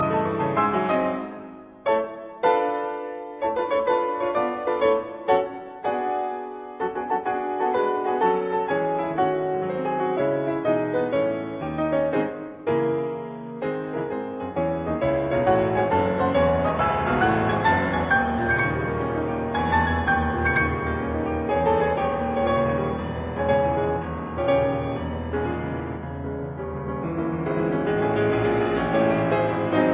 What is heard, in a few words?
Piano Sonata in C sharp minor Agitato; Bortkiewicz; Piano Sonata No 2; Op 60